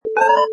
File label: Video Game Sound